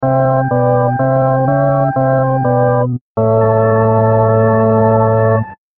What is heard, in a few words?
dirty optigan